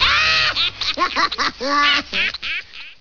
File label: "ahh"